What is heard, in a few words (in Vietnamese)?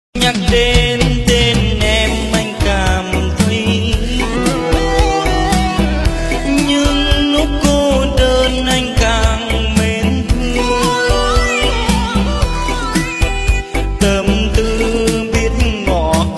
LK Nhạc Sống Bolero Rumba